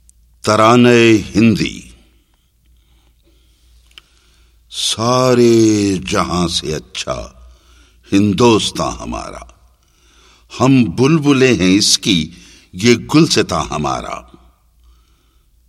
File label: Tarana e Hindi